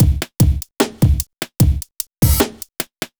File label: hiphop